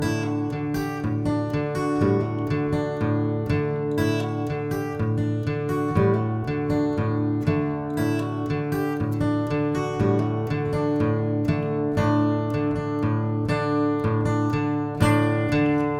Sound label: D Chord